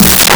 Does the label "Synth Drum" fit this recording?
Yes